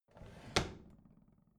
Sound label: bathroom drawer close regular